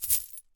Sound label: pickup; rogue savage rats